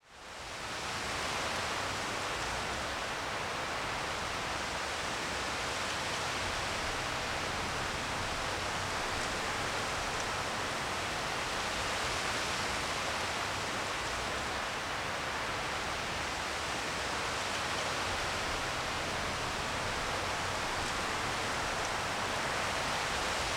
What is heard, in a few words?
woods; monster hunter 4 ultimate